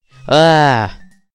scared

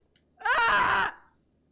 aaaaaaaahh

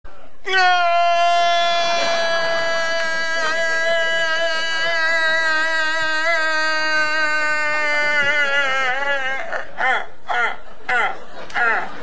cry

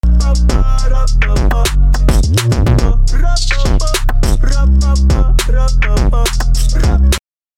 phonk remix

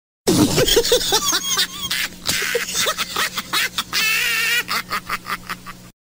laugh sound effect for radio stations

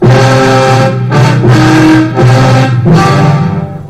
tada

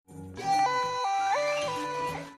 yaaaaay

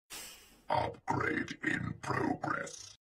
upgrade in

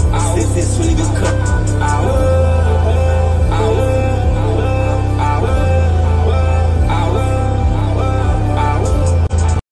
awoooo

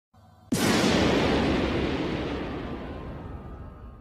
monster destroyed

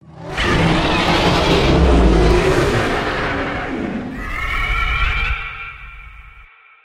legendary roar; king ghidorah

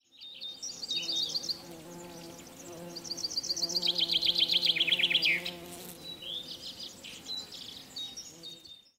bee

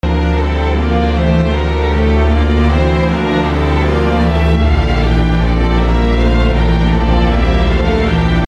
suspense; hxh

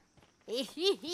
ehehe; kizuna

